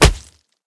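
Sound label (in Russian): слабый удар по лицу кулаком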